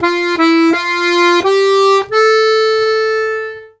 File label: Accordions; USB Soundscan vol.40; AKAI